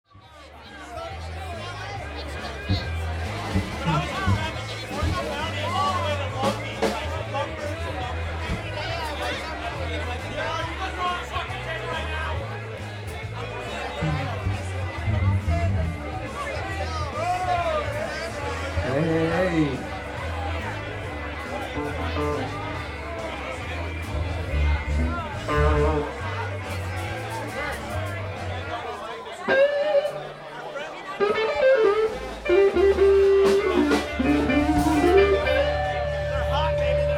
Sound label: Crowd Noise